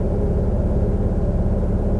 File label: vehicle misc, ambiances; CosmicRage